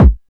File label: bassdrums, techno; single-sounds; single-drums